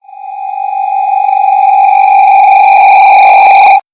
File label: horror; yttd